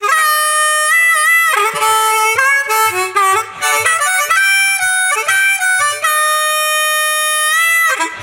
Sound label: harmonica banjo lovely music tone ringtone